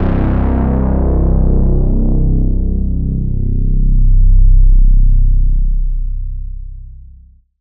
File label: BASS Brooding (C); Cymatics; Orchid Sample Pack; 808s & Basses